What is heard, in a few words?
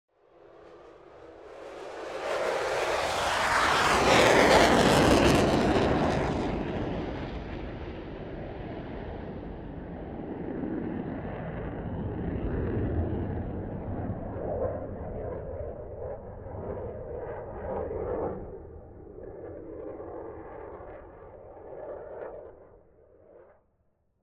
F-16 Jet Fighter passing by overhead and vanishing into the horizon; Sound Ex Machina; F16 Maneuvers